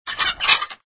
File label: Line Squeak; conservation catapult; project green